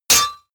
sword hit metal

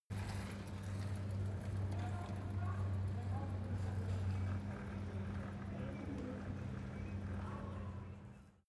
city europe bicycle

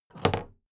big plastic case handle bounce heavy

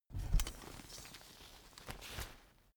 umbrella big close full soft

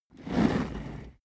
office chair trundle fast

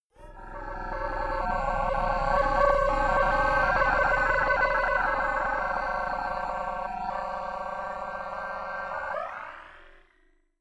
whoosh drone swell long

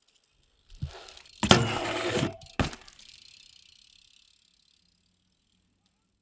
bmx maneuver arrive grind drive off

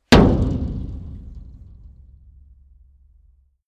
metal impact tank hard

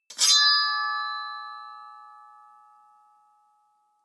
sword wield ringing

